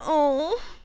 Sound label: Female Tired Breath